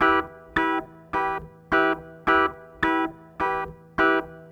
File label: Garage Funk Guitar, 70s Analog Funk; Sonic Foundry (Sony Creative Software); What it Is!